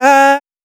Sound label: Dry One Shot - C#, Tonal Vocal; Cymatics; Euphoria Vocal Sample Pack